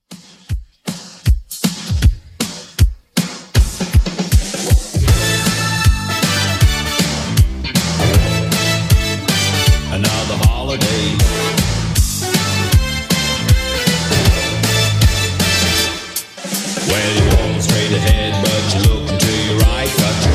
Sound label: 80s Redrum 157bpm